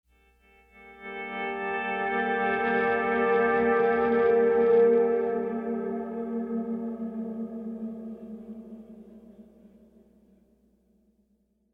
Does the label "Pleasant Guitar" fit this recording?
Yes